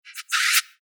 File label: Piston Release Future Robot Scifi Interface Door Spacecraft; Sound Design FX; Robot Sound Effects